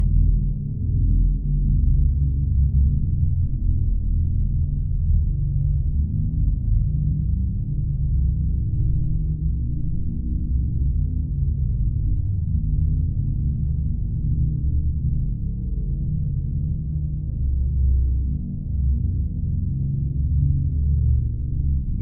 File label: Borgy Ambiance